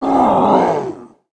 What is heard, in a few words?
fall; monster; recycle monster